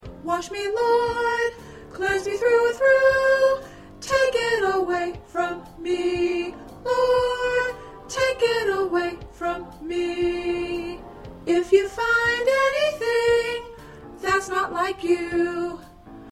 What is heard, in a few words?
Alto